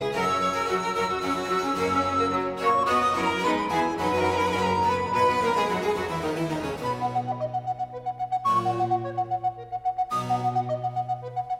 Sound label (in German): concerto c dur fuer altblockfloete und basso continuo tempo di minuet